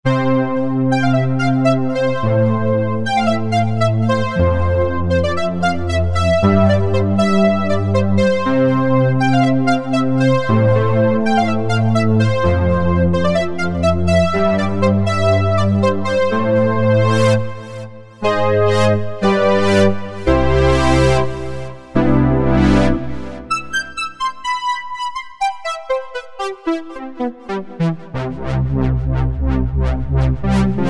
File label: Strings; Cheetah MS6